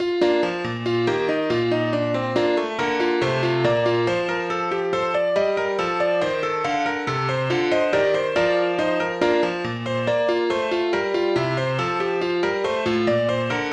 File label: Courante; HWV 440